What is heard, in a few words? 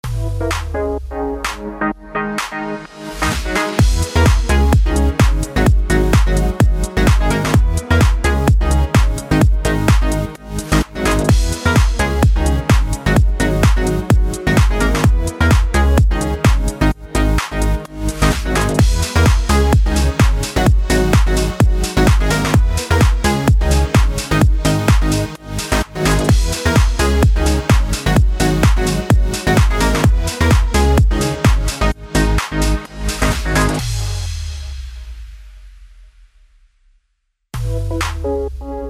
BED ELECTRO HOUSE G#MIN 128BPM